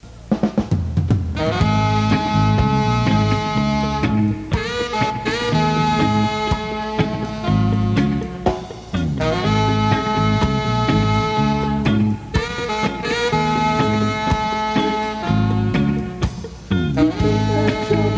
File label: One Drop